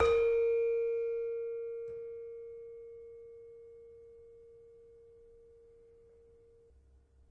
Saron, Indonesian Saron; WORLD

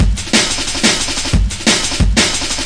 break, 180 bpm; 161 to 180 bpm

